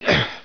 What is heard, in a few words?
throw; TNPDEMO